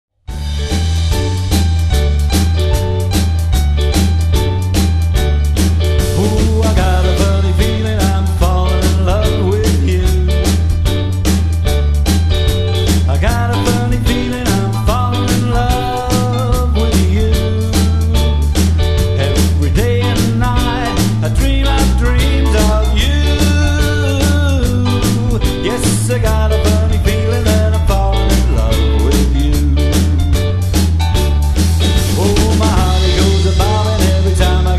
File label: Rim Shot